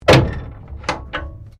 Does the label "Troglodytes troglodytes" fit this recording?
No